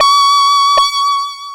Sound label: Analog High C Pattern, Synths; Sonic Foundry (Sony Creative Software); Electronic Point Blank